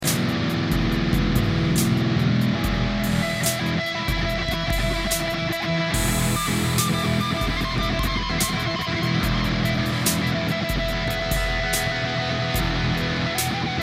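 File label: rhythm and blues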